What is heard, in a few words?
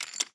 ClearBet, blackjack